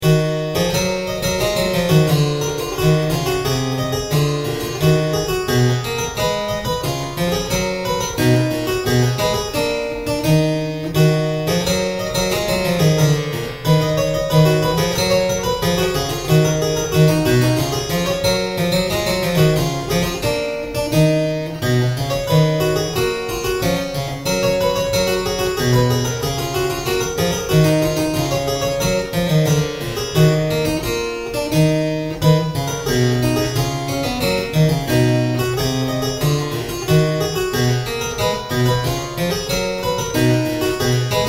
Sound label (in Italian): SPINETTA